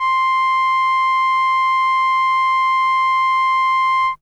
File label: Horn C6, ARP Solina, Unmodulated; musicradar-synth-samples